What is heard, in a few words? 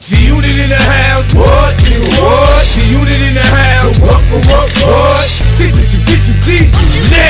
music